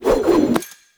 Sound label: Speargun Thrown Reload Whoosh; Warframe; Weapons